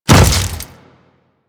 Step Flesh, Footsteps; Warframe; Grineer

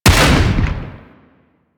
Sniper Rifle Shot; Grineer; Gorg

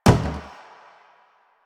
Plastic Hit Short

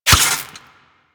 Melee Dagger Impact Robotic Flesh; Warframe; Weapons; Daggers

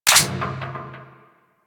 Crew Ship Turret Mech; Warframe; Vehicles; RailJack; Crew Ships; Grineer Crew Ship; Turrets

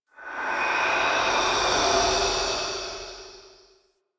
interior cave wind howls; Ambience; Misc Indoor; DE; Warframe; Infested Eris

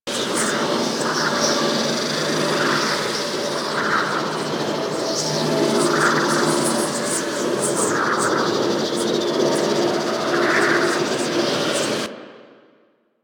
Venus Landscape Orb Wind Loop; Ambience; Props; Warframe; Venus Open World

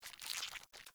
Foley Sniffle; Avakot; Friendly

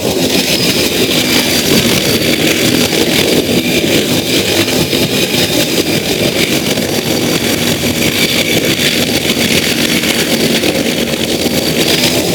Archwing movement slow engine loop; Warframe

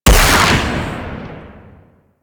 Rifle Secondary Shot; Warframe; Weapons; Wisp Rifle

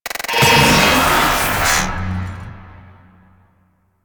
Raptor Carrier Spawn Drones; Warframe

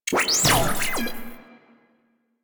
Tank Airburst Chirp Spawn; Enemies; Weapons; Warframe; Lasrian

